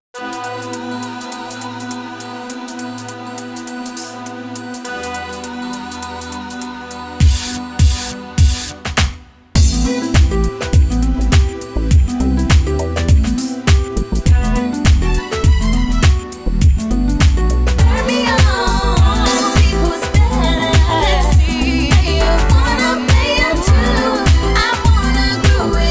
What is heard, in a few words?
Let the beat hit em! (CLASSIC R&B STYLE); Dance Dance Revolution 5th Mix